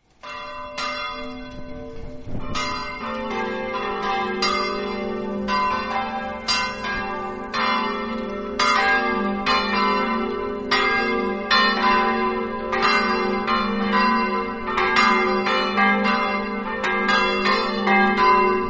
cargnacco, campane

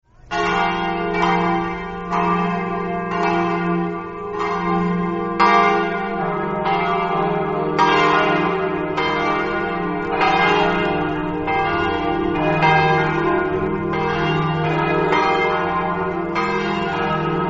ampezzo, campane

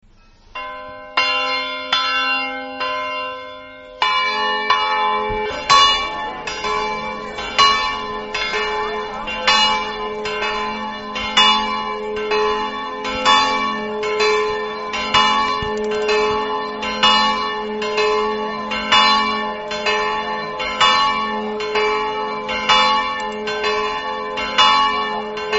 leproso, campane

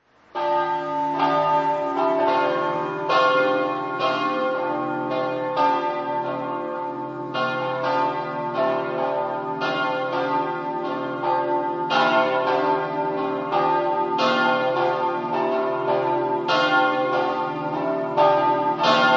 campane, attimis

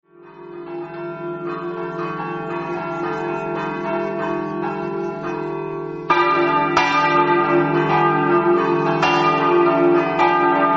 badie, campane

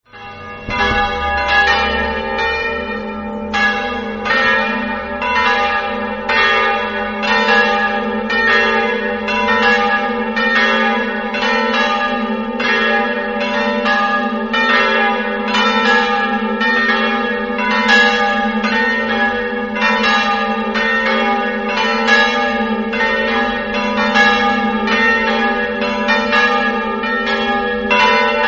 s lorenzo, campane

